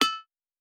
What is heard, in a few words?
Mining, Crafting; Survival Game Sounds - Lite Edition